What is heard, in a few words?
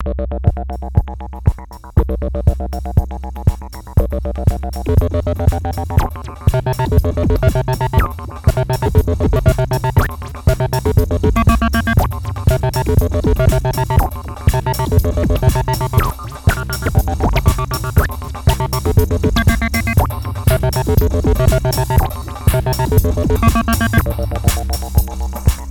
Dirty LFO Solo